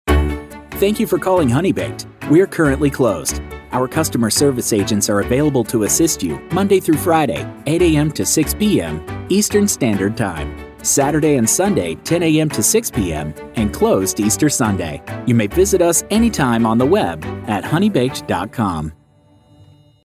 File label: On Hold